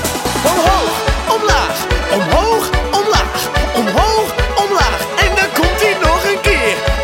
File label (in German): Jingels